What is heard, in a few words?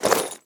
armor heavy; smite; chiron